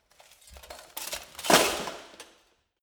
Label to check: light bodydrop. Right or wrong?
Right